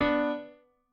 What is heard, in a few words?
piano